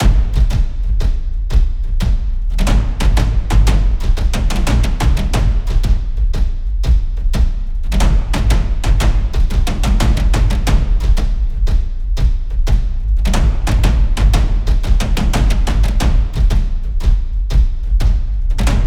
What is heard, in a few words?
Action Drums Wet